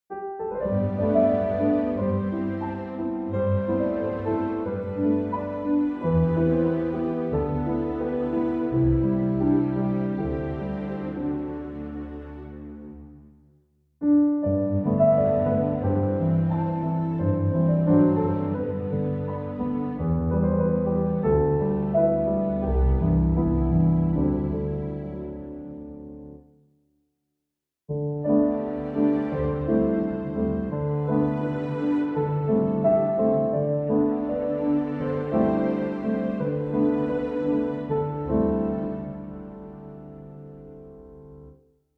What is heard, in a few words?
Underscore With Strings